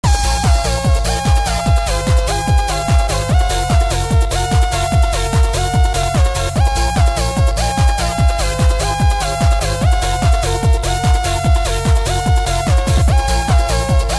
rave; tfc

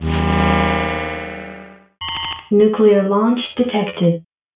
nuke launch; svencoop

